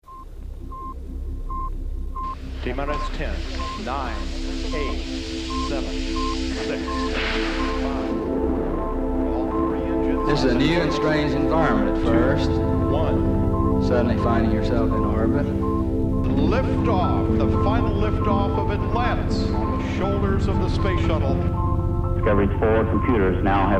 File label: Archive soundscape audio track, dark skies sounds